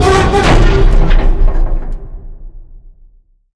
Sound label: gates wreck, underpass; ambient